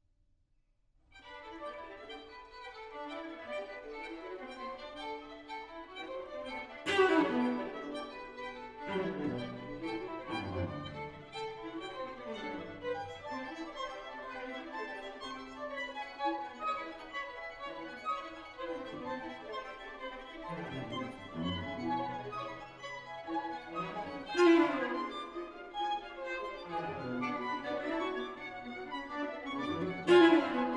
String Quartet; Edward Cowie; 'Birdsong Bagatelles'; Coot

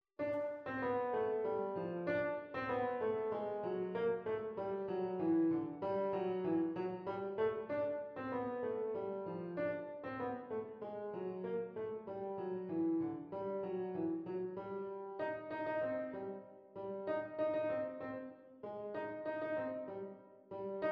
OBOE Happy; Hautbois

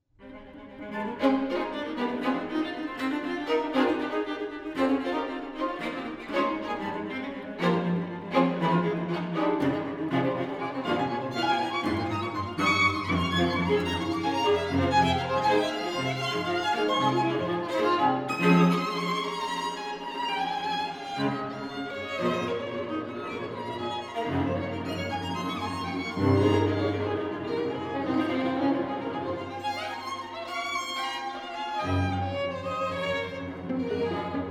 String Quartet; Edward Cowie; 'Birdsong Bagatelles'; Magpie

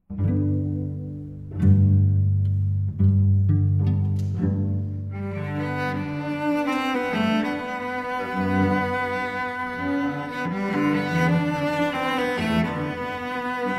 Cello Duet

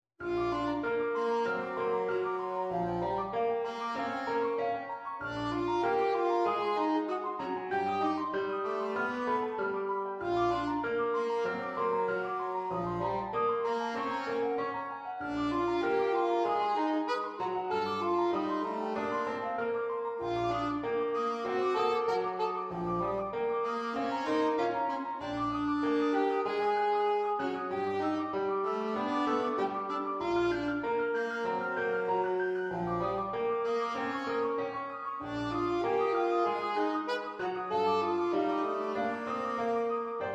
SAXOPHONE Sporadic Sax

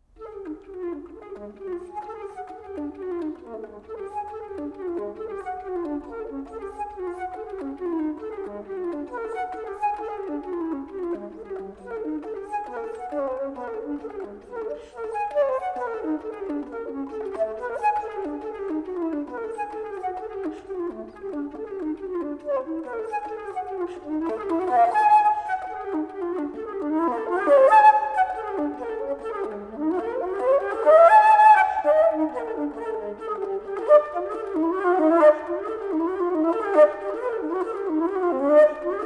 alto flute

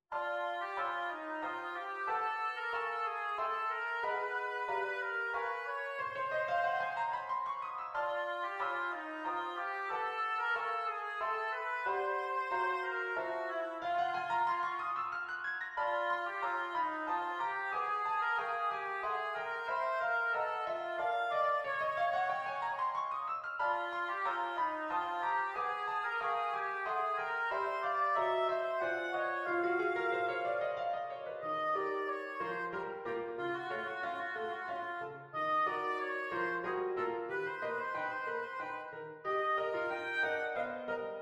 OBOE Chromatic Oboe

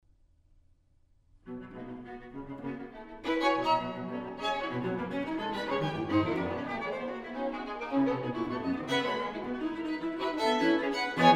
String Quartet; Edward Cowie; 'Birdsong Bagatelles'; Chaffinch

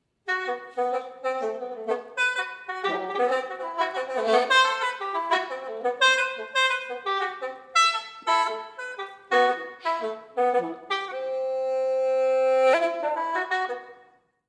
Caprices; david loeb

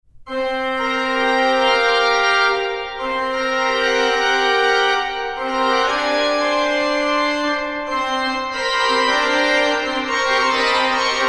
Arched Forms With Bells

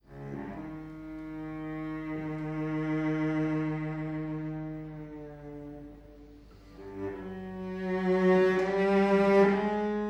Piano Trio

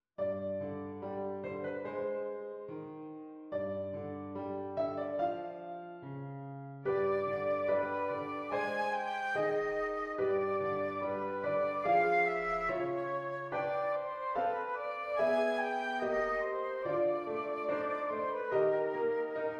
FLUTE AND PIANO Calmato

